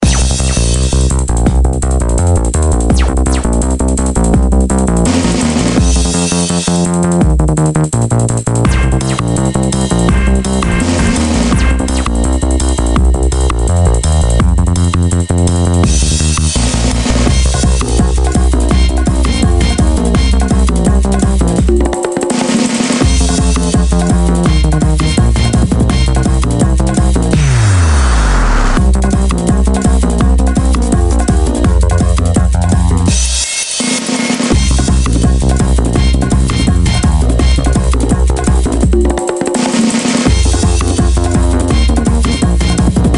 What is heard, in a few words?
Music; Street Football II; NDS